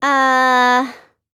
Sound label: uhhh; ibuki